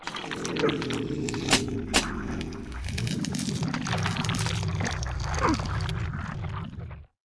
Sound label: hexscyther main, monster